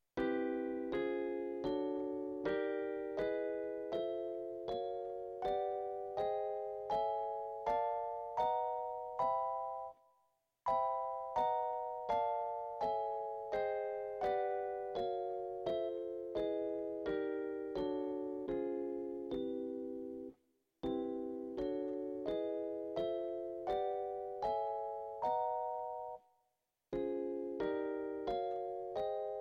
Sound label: major 7th, jazz piano